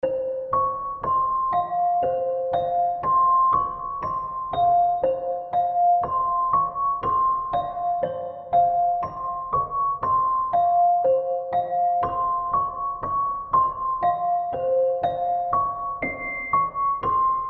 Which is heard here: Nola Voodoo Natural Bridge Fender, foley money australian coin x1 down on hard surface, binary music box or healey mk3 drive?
binary music box